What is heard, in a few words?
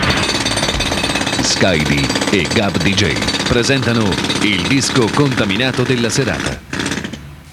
Jingle; FrequenzeContaminate